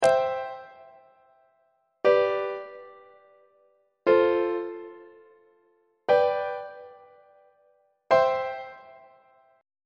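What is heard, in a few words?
b sharp major inverted imperfect authentic cadence; on treble clef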